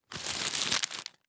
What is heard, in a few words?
unwrap; Rust